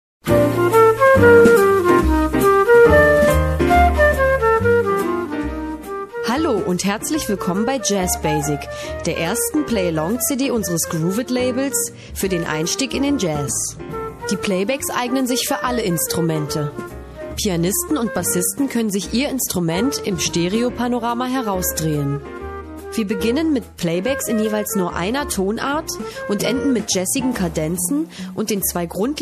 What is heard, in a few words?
trailer jazz basic